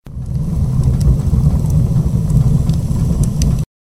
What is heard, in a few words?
fire burning